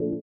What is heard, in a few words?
VIBRA; Spectrasonics; Metamorphosis